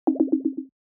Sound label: edits undone; accessibility Signal